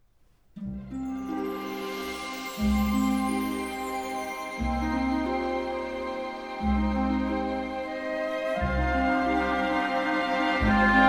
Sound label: Orchestral